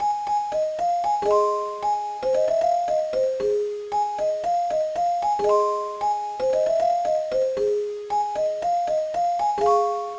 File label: Toy, Orgel